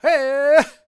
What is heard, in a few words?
POWWOW, LAKOTA, World Voices; ILIO; Vocal Planet VOL-5